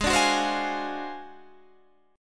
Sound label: D 7th Sharp 9th Flat 5th G sharp